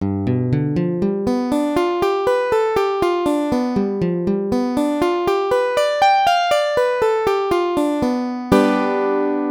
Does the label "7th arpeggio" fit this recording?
Yes